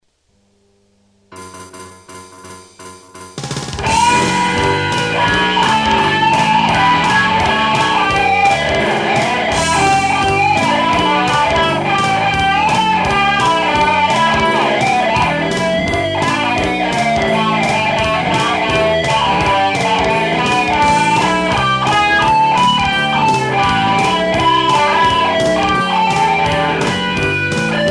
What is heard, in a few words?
Wah Wah Stomp; guitar; Mazter Guitarz